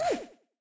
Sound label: oof; sm64coopdx; char-select-extra-chars